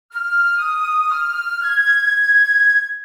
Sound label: SFX Wood Wind Melo